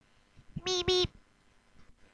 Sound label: Meep meep